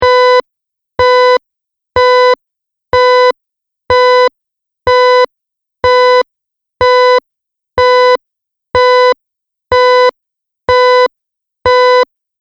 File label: Hospital Warning Alarm; Alarm Sound Effects